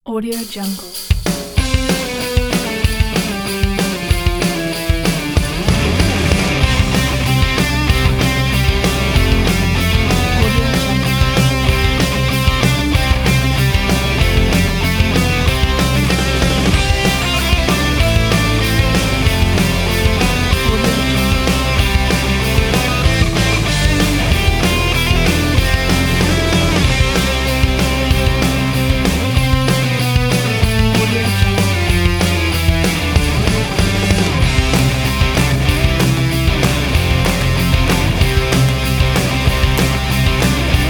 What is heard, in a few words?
good king wenceslas jingle bells